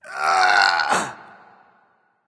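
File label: nonverbal grunt lift; smite; voicepack; ullr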